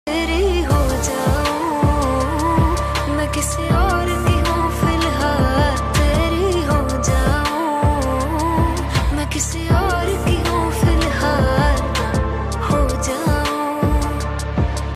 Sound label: bollywood songs